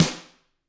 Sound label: GRETSCH SNRR, Drums; ILIO; Double Platinum Drums 1